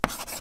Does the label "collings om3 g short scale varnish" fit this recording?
No